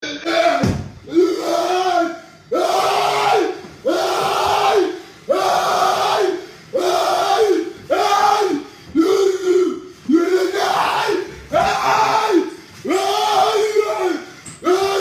screamin; rudiger

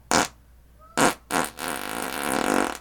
fart

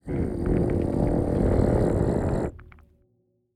warden angry

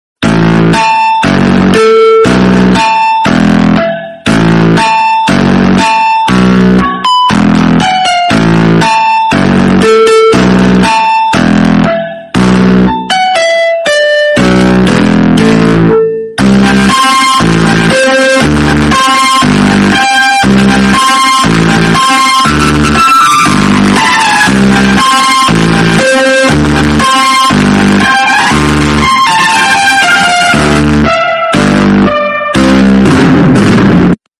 tornado funk

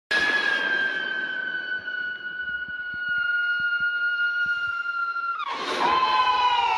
hissing souls

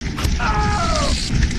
person pain

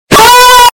aaaaaaa onooooo

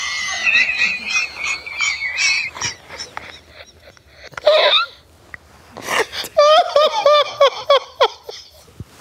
wheezing

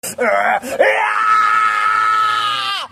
scream; gokus

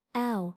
ow

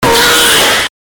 gag

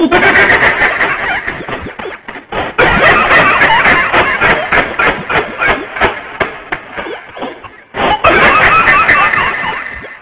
low quality laugh

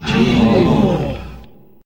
gasp

